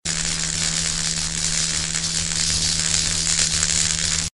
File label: laser beam